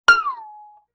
Violin Pluck Slide; Vintage Cartoon; Musical Elements

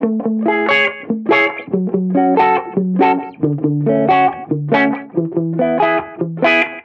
Reggae Guitar Loop 140 BPM A Maj; Vibrations; Cymatics; Vibrations Guitar Sample Pack

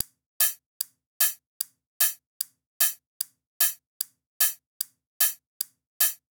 Dubstep Drum Loop Cymbals, 150bpm; Stem Seperated

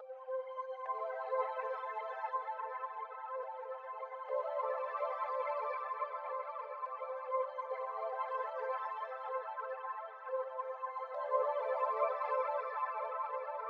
140 BPM A Min Pad; OB6; Stems; Cymatics; Manifest

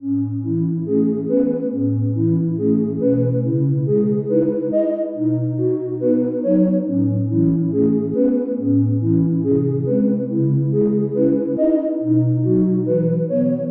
Nostalgic Melody Loop 140 BPM C# Min; Cymatics; Oracle Sample Pack

